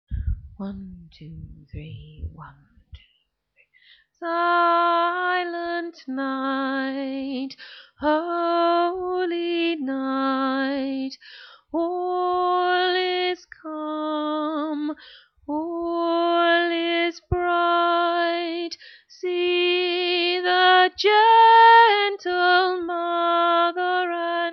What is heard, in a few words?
Alto